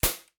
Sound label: shooter gun shot fire